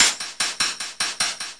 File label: Chuff Echo, Synthetic Pulses